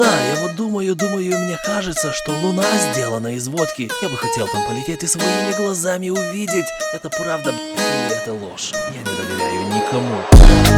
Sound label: Funk